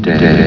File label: jump; openffa; hal